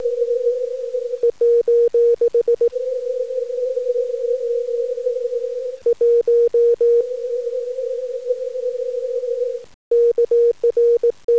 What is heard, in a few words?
15m qrp